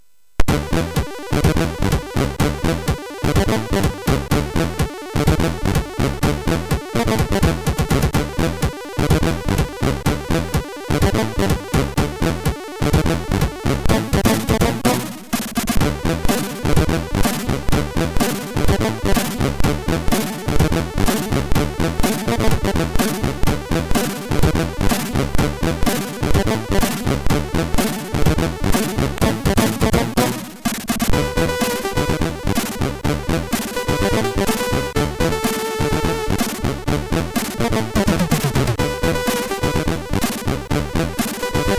Beat, MOS6581R3

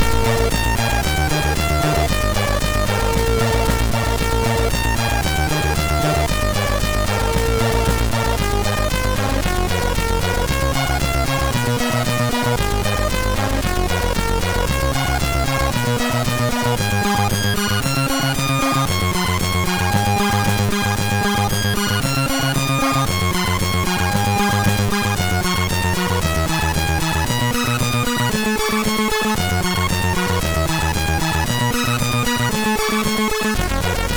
sid, MOS6581R2

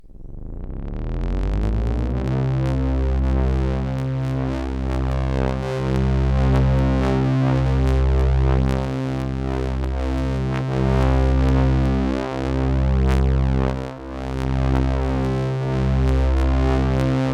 Hmmmmm, MOS6581R3

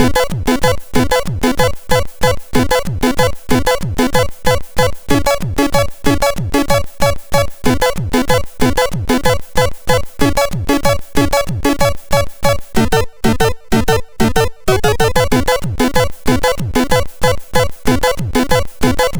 West, MOS6581R2 SID; Creo

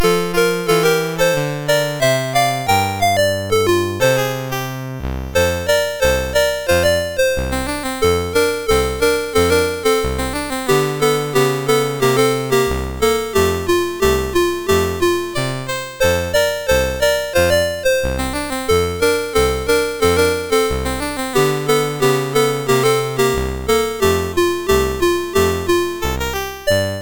Music, MOS6581R2

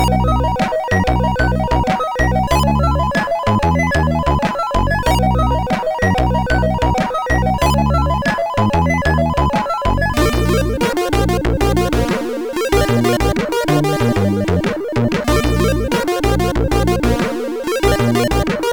Bended Wave sid MOS6581R4; hvsc

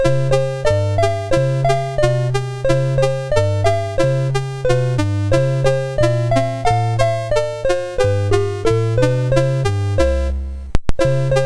Yankee, MOS6581R2; M4 Yankee BASIC

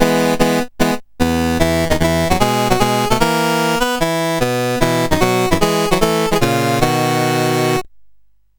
menus, sid CSG8580R5; Games Summer Edition

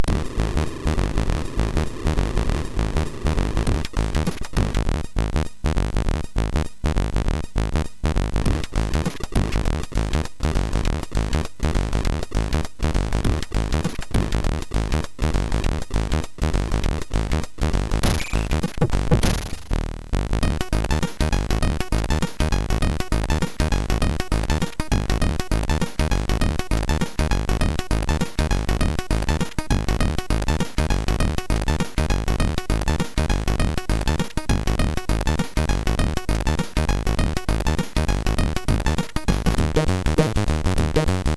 Kick 2, MOS6581R4

